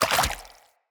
Root Spider Mother Step Water, Spiders

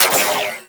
Miter Shot, Weapons; Warframe; Tenno

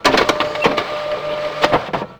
Office Fax Machine Misc; Warframe; Ambience; NineteenNinetyNine

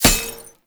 Medic Drone Hit Notification; Abilities; Warframe; Tau; Dax Medic

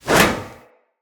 Shield Whoosh; Weapons; Swords; Dendrit Sword And Board

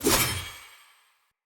Throwing Knives Throw Heavy; Weapons; Pothia

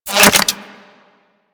Projectile Return; Warframe; Sentinel; Crp Melee Pet

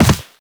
Land Soft Default, Footsteps; Characters; Operator

